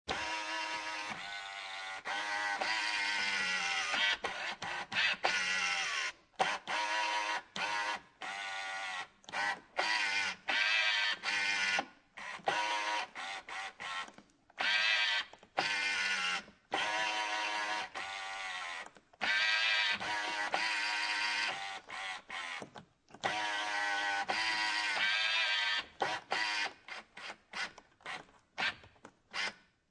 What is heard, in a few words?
Car Interior Adjusting Side Mirror, Car Interior, 1996 Acura Integra, Adjusting Side Mirror, Automatic, No Engine; Transportation Automobiles